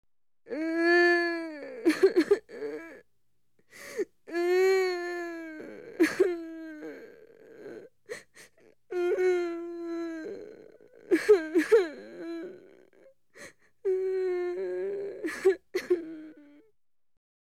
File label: heartbreak crying; Ambient and Specific Samples